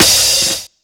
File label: Open Hi Hat